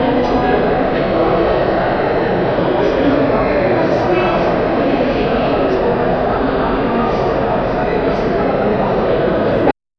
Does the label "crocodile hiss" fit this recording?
No